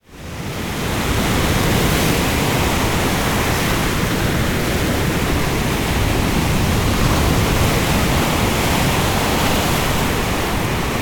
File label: Sea Ocean Storm - Heavy Waves Surf And Sea Rumble; Hollywood Edge; sound designer's toolkit v2